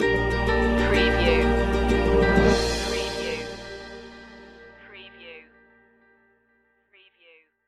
sovereign sun stinger

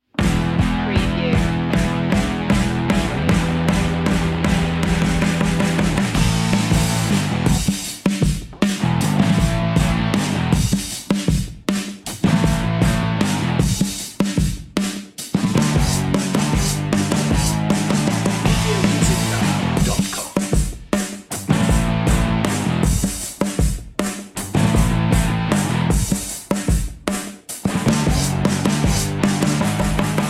southern fuzz underscore

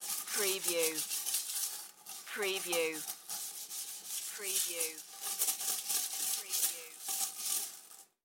Toilet Brush Clean

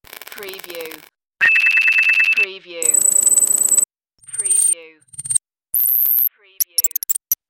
alien telemetry

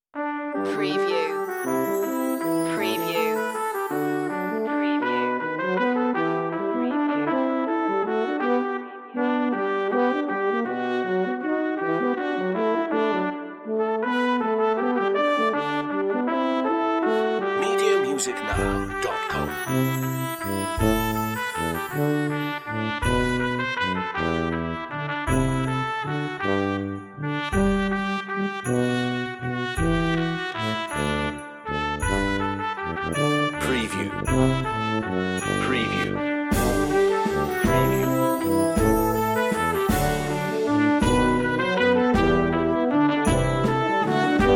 wish you a merry christmas brass band

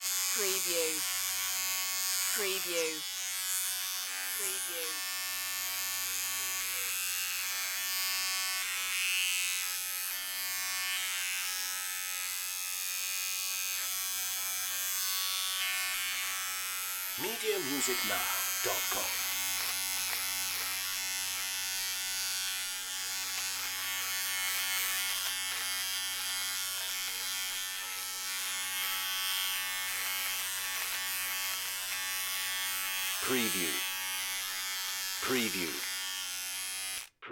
Electric Razor; Sound Effects Bible

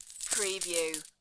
General Rattling of Coins Against Coins

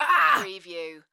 Strain Grunt Female

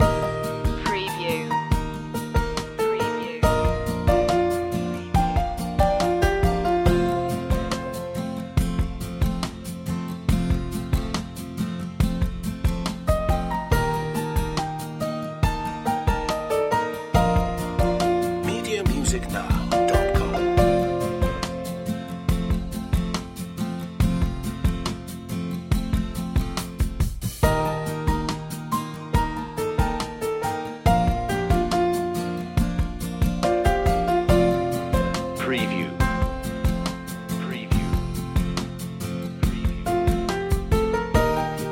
acoustic

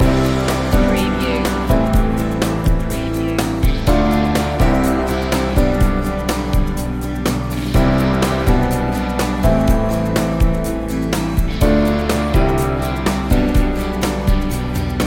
casual goings loop

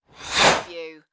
Ice Skates Scrape; Sound Effects Bible

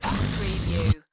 Balloon Flabber

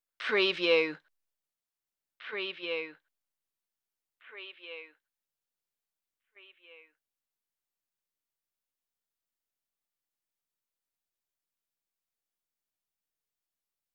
domain of destruction drums only

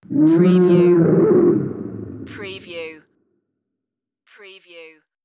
Dinosaur Roar Distant

